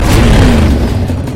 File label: Car Engine Starting